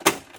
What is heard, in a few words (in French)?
Interrupteur